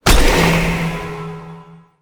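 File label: satanic gate death, monster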